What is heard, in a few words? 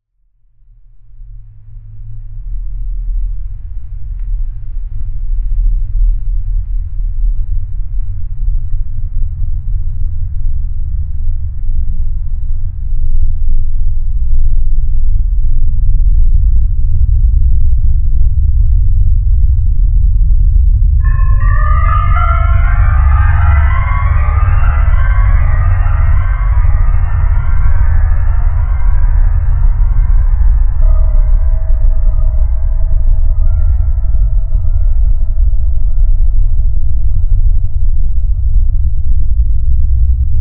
instrumental ambiance